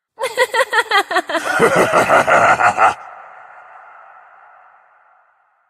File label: Laugh; Kindreds